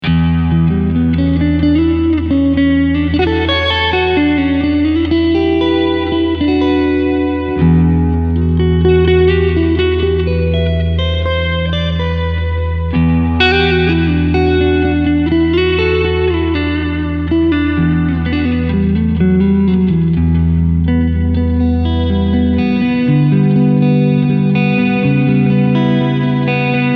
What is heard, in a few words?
Cleansound FULL Reverb